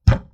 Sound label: wood door bang outside